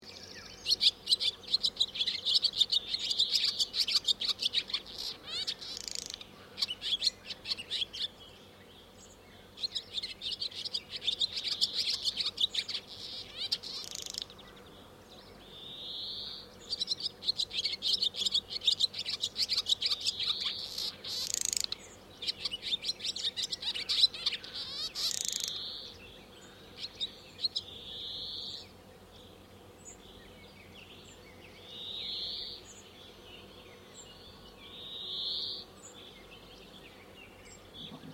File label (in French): hirondelle rustique chant